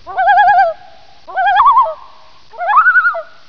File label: loon call